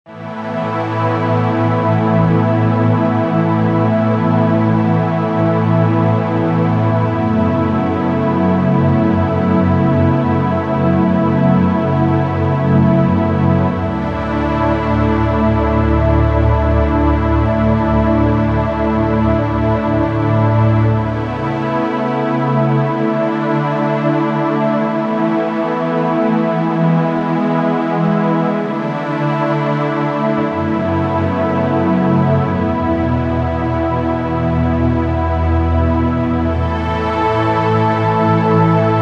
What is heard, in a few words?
Underscore PAD; Worship Flow